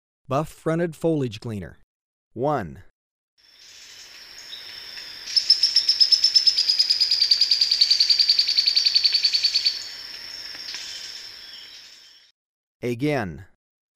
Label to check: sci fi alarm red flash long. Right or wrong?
Wrong